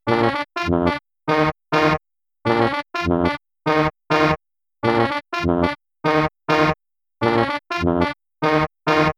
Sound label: Game Start; super spamton 64